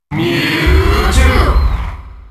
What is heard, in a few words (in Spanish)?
Grito del público; Mewtwo; SSBM